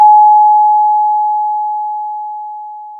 Sound of a doorbell

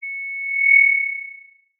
Sound of an owl

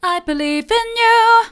i believe in you mid, vocal

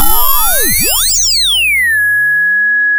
pop, sound of a bike bell

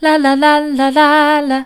la la soft, vocal

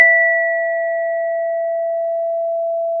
pop, Sound of a school bell

